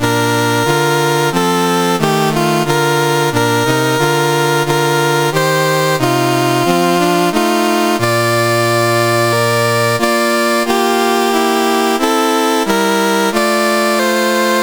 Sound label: CSG8580R5, National Anthems; Guyana